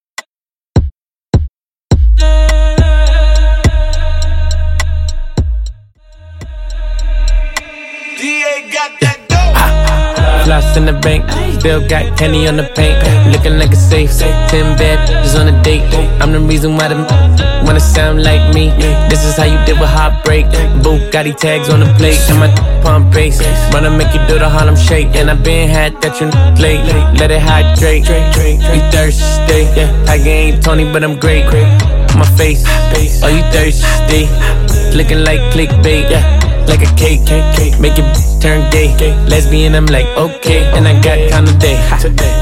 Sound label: Extended Redrum